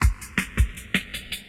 DRUMLOOP D+B 160, Drum+Bass Loop; eJay

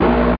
warn

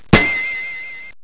DING; The Ultimate Guide to Mortal Kombat

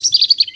Bird

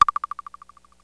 bleep, Synth

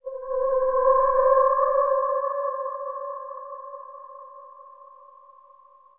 sphere ambient 160

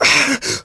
pain; Shadow Man; shadman

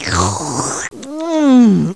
EAT; BANZAI

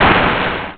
blert; 300 games for mac; Arcade; Boogers 1.12

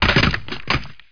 sound trooper body; MOTS

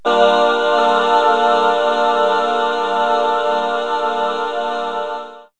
Voices Bridge, Chorus & Voices; KABOOM! - Wild and Wacky Sound Software; Music

